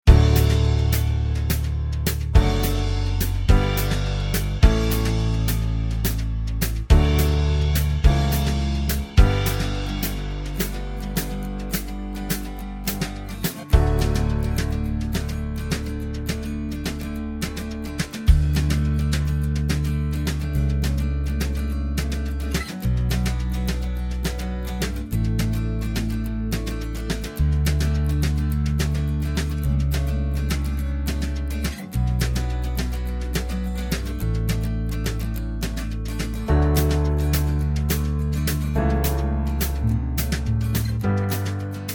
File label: (D) No Backing Vocals